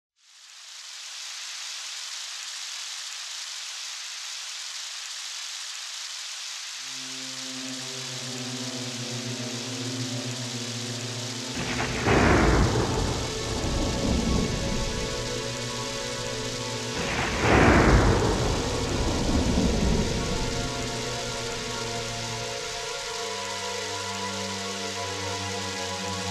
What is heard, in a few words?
horror